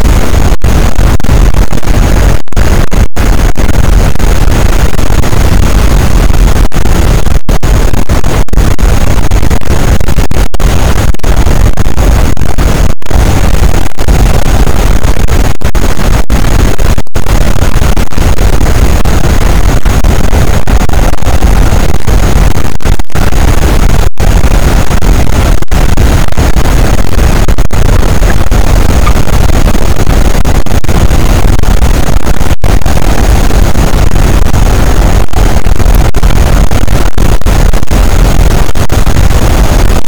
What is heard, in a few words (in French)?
cachalot, boat; Physeter Macrocephalus